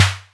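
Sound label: 808 snare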